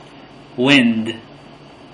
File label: wind